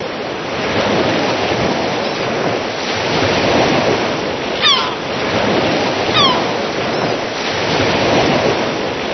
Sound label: Seagulls; 暴力赛车